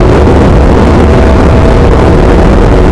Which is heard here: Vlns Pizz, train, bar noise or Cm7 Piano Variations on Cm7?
train